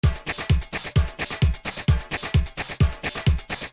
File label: loop